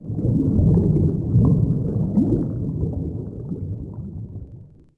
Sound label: bubbles; DAoC